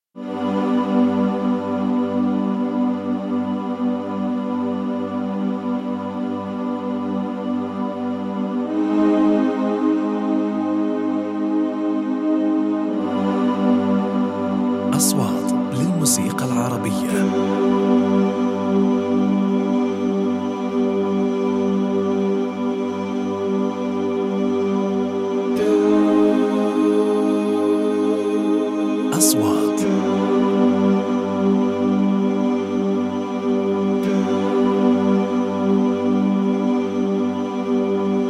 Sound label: vocals